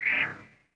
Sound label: Reachability Enabled, mono; iOS 26 Sounds